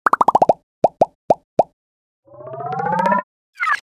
Infographic graph build up pops whooshes

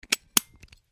Large metal padlock shut click close; locks keys

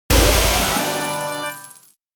Casino slot machine jackpot small win

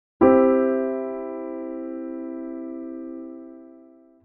Piano C major chord

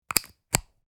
Headphone jack insert; headphones and speakers

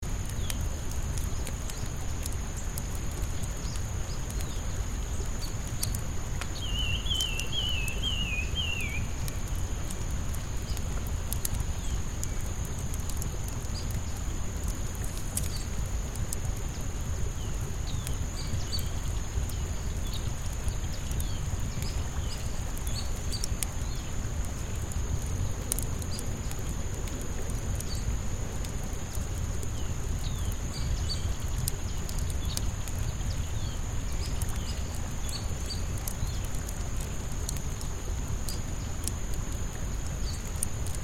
Forest campfire crackling ambience

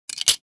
Casino game slot machine insert coin coins

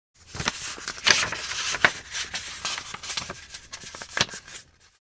Paper handling folding

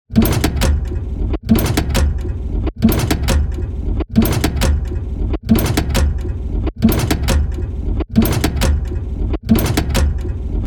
Heavy industrial press machinery loop

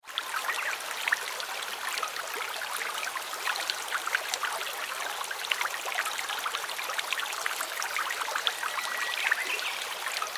River ambience water flowing gently and calmly

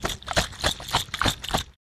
Potion bottle glass shake

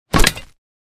Drop battle axe on dirt

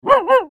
Scared dog bark

